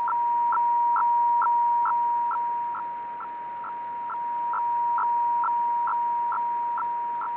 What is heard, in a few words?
ARQ6-90 IRS; ARQ6-90 in IRS mode